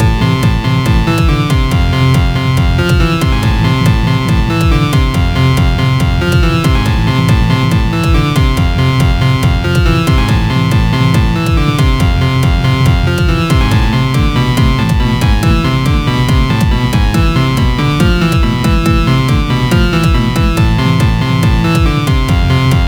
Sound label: loopable, Music; project-undercover